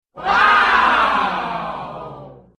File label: woooow sound effect